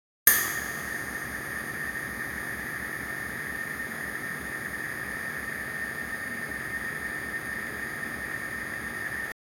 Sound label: slow stirring sound in a, cooking